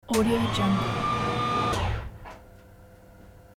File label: servo motor; hollywoodedge